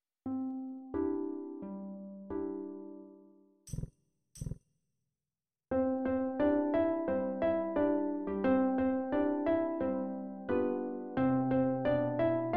Community Songbook for Harp Ensemble, Yankee Doodle